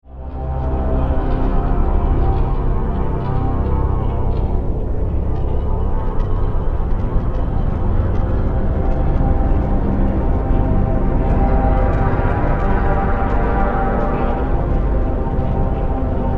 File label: Synth, Stab, Drone, Horror, 5.1; Sound Effects:Science Fiction; BlastWave